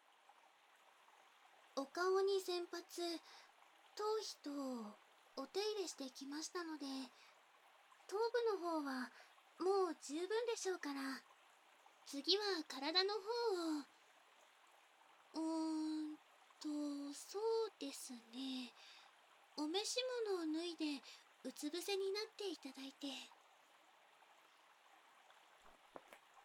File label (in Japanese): 手とオイルで肩と首のマッサージ; ビャクといっしょに